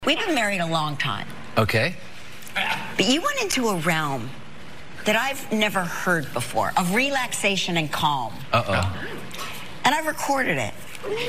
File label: snoring; KELLY RIPA